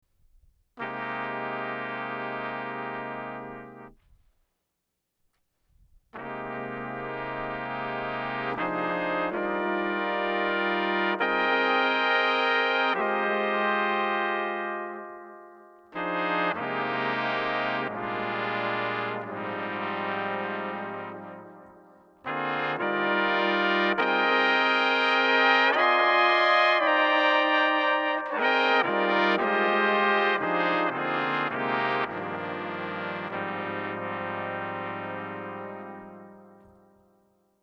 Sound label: 5-Note Pitchfactor Chord; Trumpet; C-b13; Pitch Manipulation Pedals; Jonah Harmony